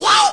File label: "woo"; kinison